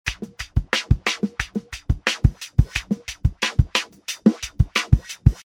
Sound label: drums phase heavy